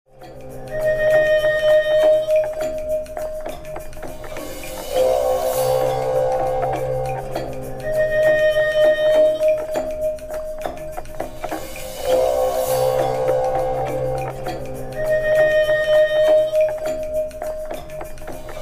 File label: bow a fridge a phone